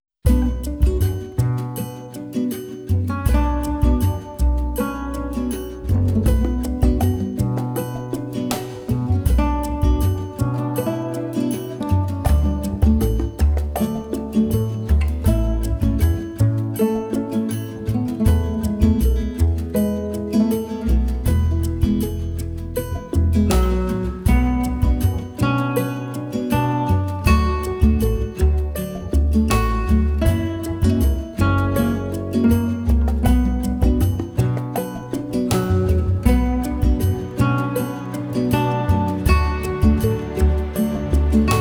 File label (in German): Südamerika 80bpm